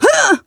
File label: die, female